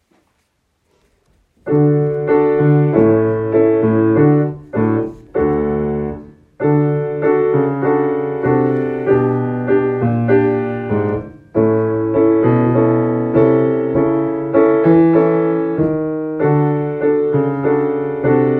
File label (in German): Klavier